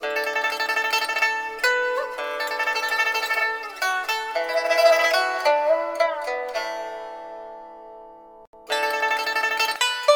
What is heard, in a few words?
ui pipa; chaguan; Identity V